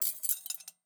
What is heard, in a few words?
Silverware Movement, Kitchen